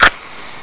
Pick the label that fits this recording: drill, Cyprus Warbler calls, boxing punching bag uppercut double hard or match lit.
match lit